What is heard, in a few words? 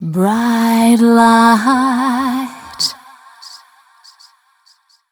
f mel c, fem Vocals; Mutekki Media - Diamond Vocals 2